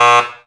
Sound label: Buzzer; EarWax; System Alert